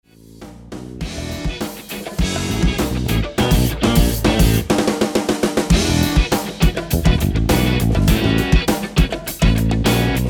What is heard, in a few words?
Bass